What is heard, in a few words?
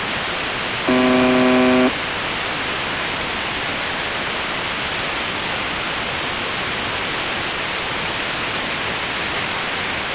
buzzer usb msg